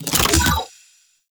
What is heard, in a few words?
Artifact Pickup; Warframe; Missions; Sentient Defend